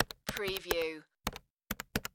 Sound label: Telephone Dial Push